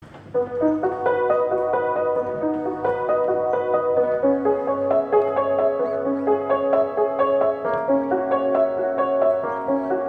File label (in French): jouer sur un piano desaccorde